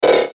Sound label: Whoosh Digital Beeps Short; Part of Whooshes (Sound Effects:Sound Design); Blastwave